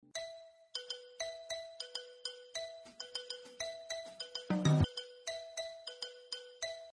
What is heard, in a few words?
menu bgm